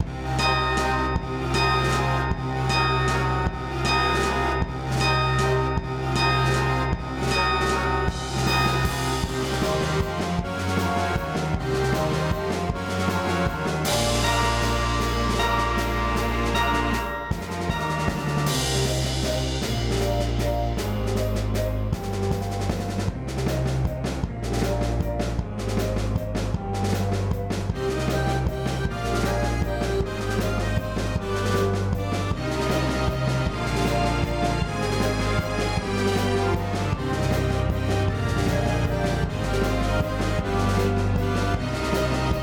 high seas, A piratey backing track for piratey video games; Liberated Pixel Cup; Synthesized/tracked with LMMS; Key is Cm